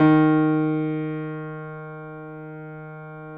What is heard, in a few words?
JAZZ D#2, Keyboards Acoustic; USB Soundscan vol.17